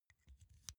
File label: insert xlr extension quick; objekte; elektronik